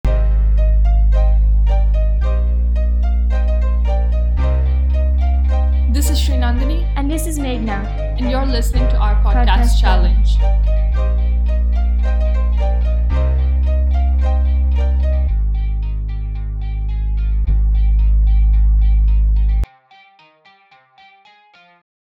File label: Podcast Intro